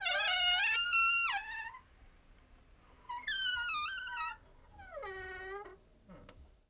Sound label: Squeaky Door Slow